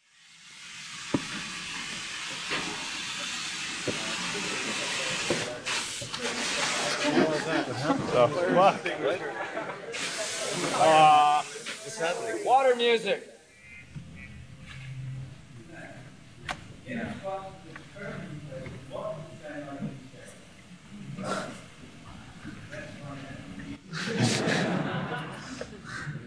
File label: Water Music & Tuning